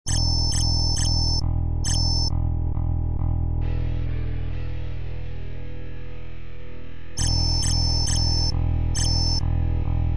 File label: cricket